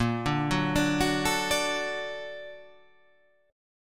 A sharp Augmented Major 7th A sharp, guitar

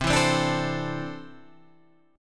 C 6th Add 9th C down Guitar Standard; strummed; capo=7

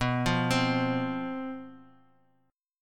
C Major 7th Suspended 4th, guitar

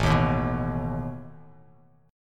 A Suspended 2nd Flat 5th A down Bass Standard; Asus2b5 strummed

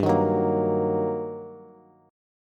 G Minor Major 7th Sharp 5th, G, down, Bass; GmM7#5 strummed; JGuitar

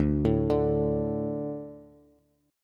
D 5th, Bass; 10,12,12,x; arpeggiated; JGuitar

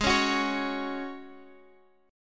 Db Suspended 4th Ab

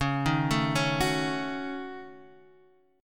Gb Minor 6th Db

